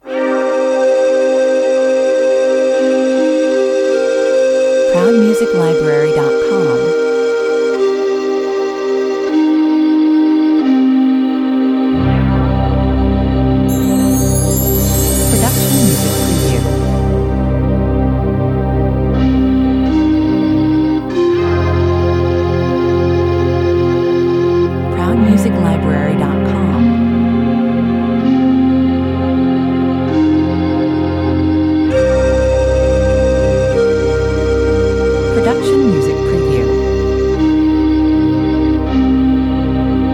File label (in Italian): flauto 70 bpm